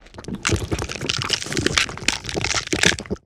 Bone Crunch & Snap Liquid, Hand To Hand Combat; Sony Pictures Sound Effects Series

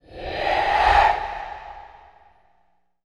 reverse surreal breaths; Sound Design - Horror; Sony Pictures Sound Effects Series Volumes 1-10; Sony Creative Software

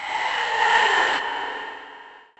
blaze; RYL

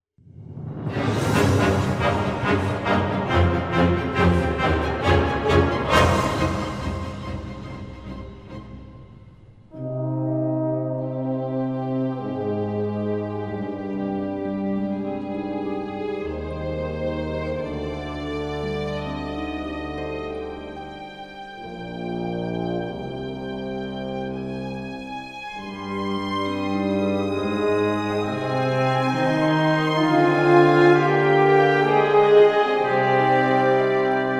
Music

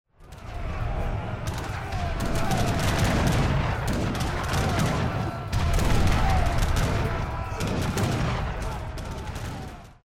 Fighting Close; Age of Empires III; cinematics

